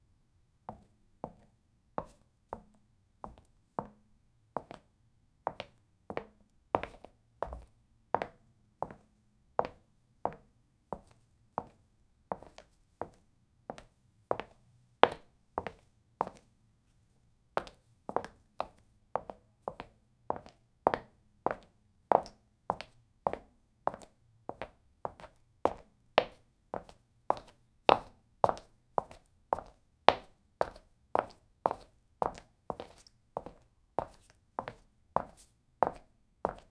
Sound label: high heels walking sound, clack